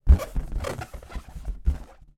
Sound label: foley balloon inflated handling